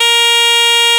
Shenai C3

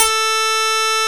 Strat C#3

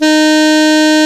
Sax G2

